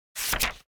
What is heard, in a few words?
Book